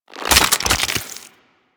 Reload; Warframe; Infested

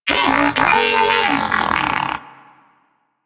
Player Down, Moa Pet Tenacious; Warframe; Pets

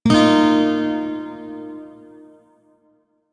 Shawzin Blues Chord, Music; DE; Warframe

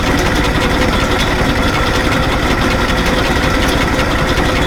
Shipyards Crane Track Loop; Ambience; Props; Warframe; Grineer Shipyards

